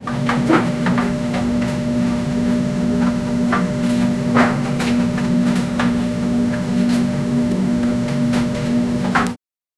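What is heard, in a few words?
the ambience of a futuristic machines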